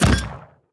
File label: heroes basic Attack cast; broden